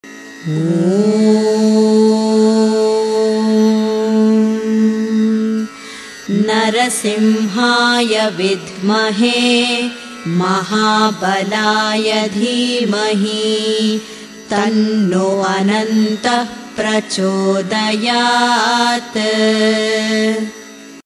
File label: narasimha gayatri female voice